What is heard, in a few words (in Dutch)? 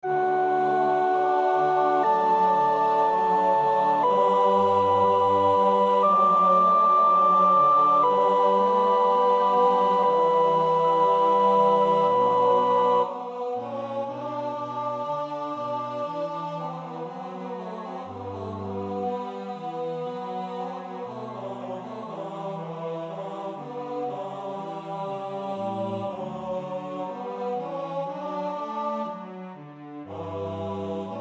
orkest sopraan, koorpartij oefening; Telemann (Philip); Der Tag des Gerichts